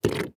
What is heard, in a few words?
water liquid mud swamp fart